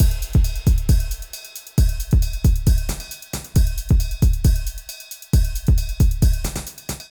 Beat - Full, Grime, 135bpm; Zero-G Sound Sense Grime Scene; Construction Kits; A Positive Vibe

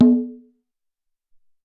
Quinto

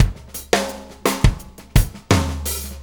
Drum Tools, Loops

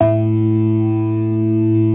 CLASSIC C3, Synth and Organ

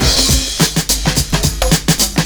CYMB, Breakbeats

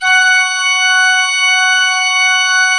F4, Cathedral Organ, MANUAL PLENO; Propeller Island

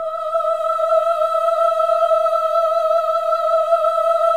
VIB M E5B -L, Symphony of Voices; Spectrasonics

